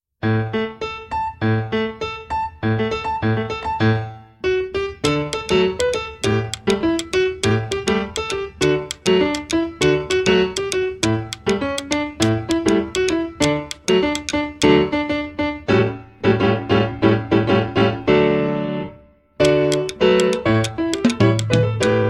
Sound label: piano percu, jazz; petite histoire du jazz; Chants du spectacle